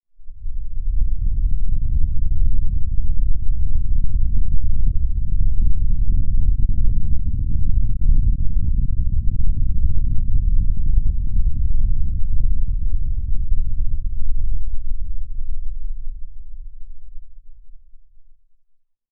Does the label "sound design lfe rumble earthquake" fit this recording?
Yes